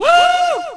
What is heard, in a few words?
crowd; Tony Hawk's Project 8; XBOX; human